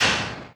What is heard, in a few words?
highlight top; ui